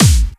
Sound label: Bassdrums Hard Kicks; Vengeance Samples